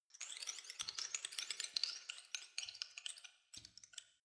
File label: organism short